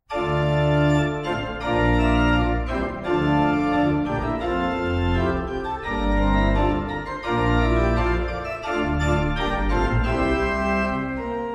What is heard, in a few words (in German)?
Marsch